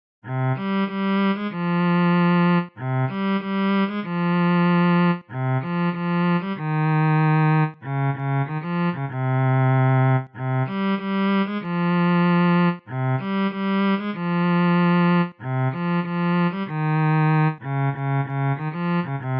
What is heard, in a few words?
Viola